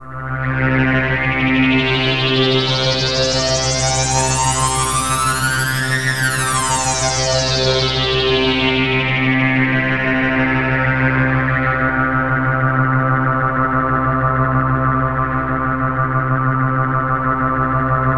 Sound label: Access Virus B; Synthworx